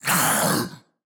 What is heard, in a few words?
Hit Vo; Magic Shrek